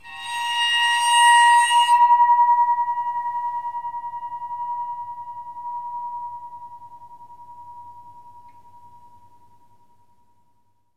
WATERPHONE C; ILIO; Synclavier Percussion 2; World & Orchestral

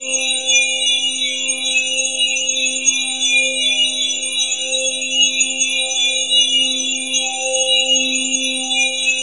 BELL ATMOS; Zero G; Malice In Wonderland

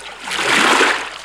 ROW, Boat Rowing

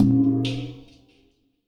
H20 PAN, POTS + PANS; Spectrasonics; Liquid Grooves

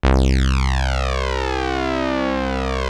DS8 C 1, Korg; Back In Time Records; Korg Universe VOL-1

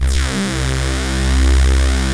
MIX STAB, DANCE; Pro-Rec - Synthsonic; Z1 DANCE

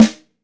YAMAHA SNARE

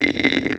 TB THROAT EE, Talk Box

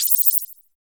SCATTER PRC; TEK PERC2

